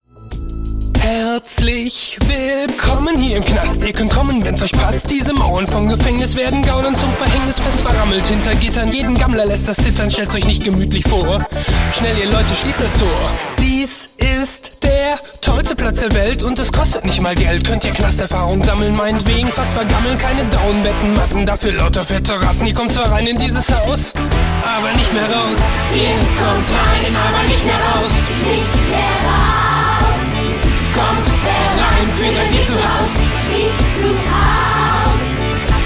Gefaengnis Rap

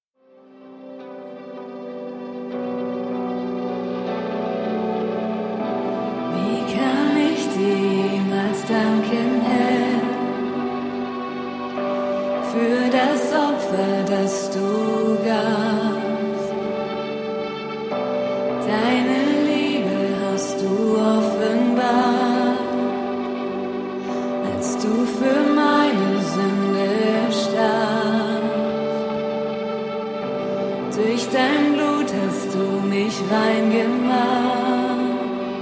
Dort am Kreuz, Live

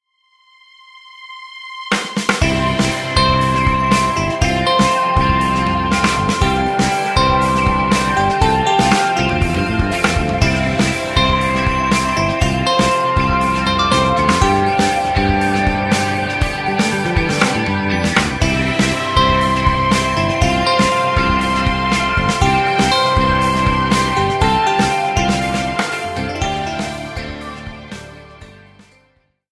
Hoerspielmusik; Fuenf Geschwister

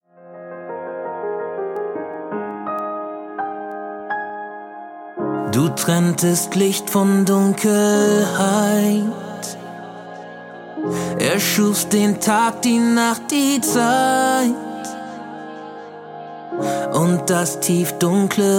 Samba

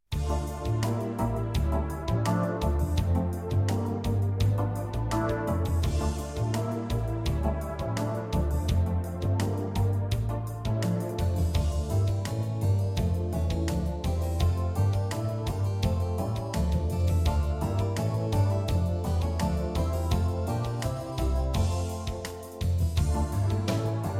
Bourree aus der Lautensuite

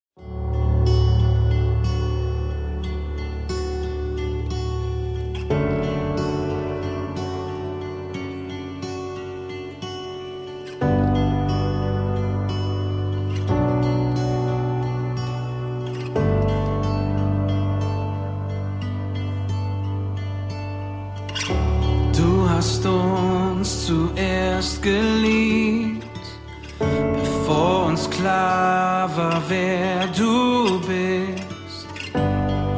akustik session

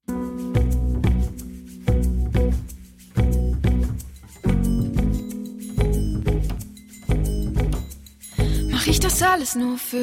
Akustik Version

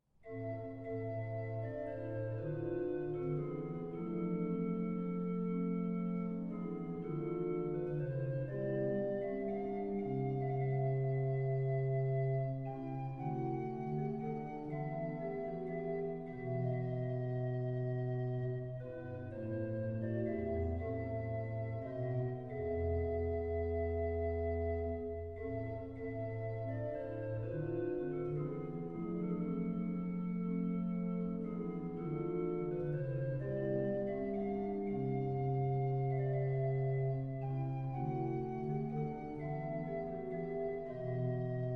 Courante, Orgel; Terpsichore

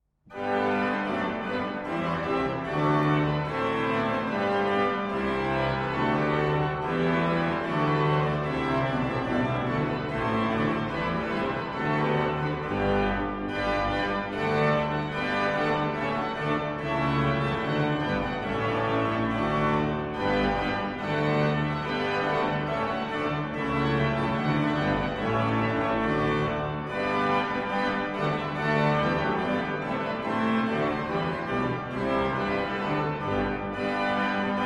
Bransles doubles Tanz, Orgel; Terpsichore

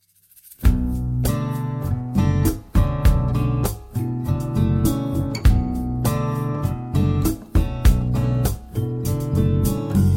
Bass

